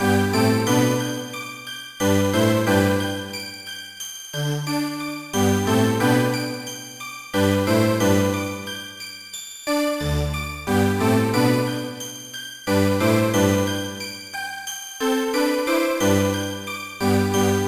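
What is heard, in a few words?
background music; TSUSHIN2; Jewelpet: Kawaii Mahou no Fantasy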